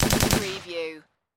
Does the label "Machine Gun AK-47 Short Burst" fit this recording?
Yes